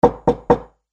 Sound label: knock knock